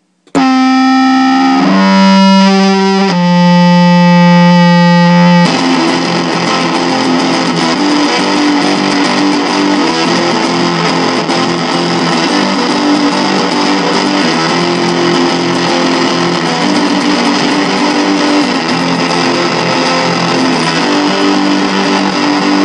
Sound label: acoustifuzz